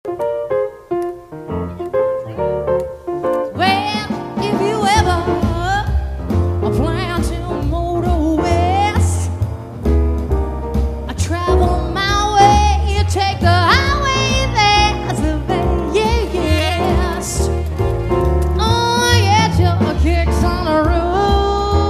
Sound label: Jazz Duo, sax guitar duo